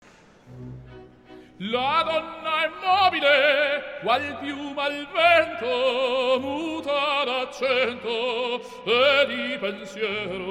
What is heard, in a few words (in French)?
ténor; ténor (Verdi, Rigoletto)